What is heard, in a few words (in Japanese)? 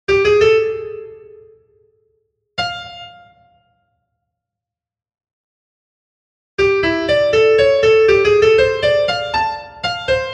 フレーズづくり 2 ブルーノート と マイナーペンタ と コード